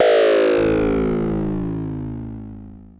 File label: Klone Dual Percussion Synthesiser FX